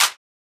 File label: Clap